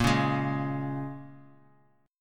A sharp Minor 7th A sharp, Bass Standard; strummed; x,13,11,13; JGuitar

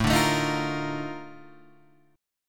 Bb Major 11th A

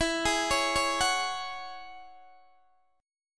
E 6th Add 9th E down Guitar DADGAD

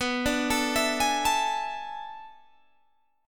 B Minor 13th B down Guitar Open G

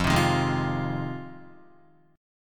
F Minor 7th F Guitar; strummed; JGuitar

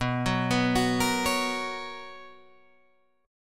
B Major 7th Suspended 2nd B Guitar Drop D

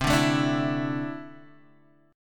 D 7th Suspended 2nd, chord

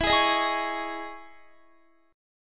E Major 13th E, Guitar; strummed; 4F,4A,5C,5G,5C,6E; JGuitar

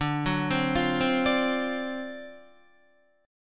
D 7th Sharp 9th D down Guitar; D7#9 arpeggiated; JGuitar

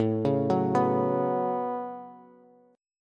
A 6th Add 9th A down Bass; A6add9; arpeggiated

